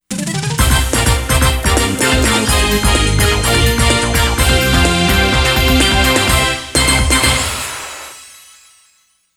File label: Fanfare (All Clear); music from konami arcade shooting cd box